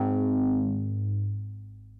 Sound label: chip synth